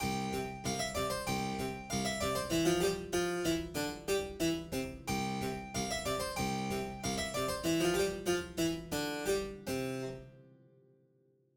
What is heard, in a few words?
Closed Lid Live Medium Stereo Loud Grimaldi